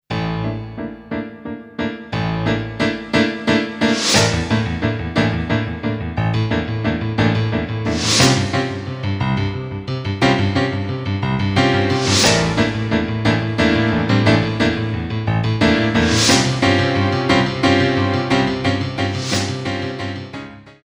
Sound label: Grand Battement, Ballet